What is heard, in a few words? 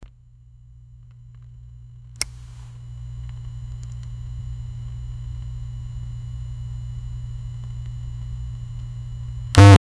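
a sound effect to indicate, technology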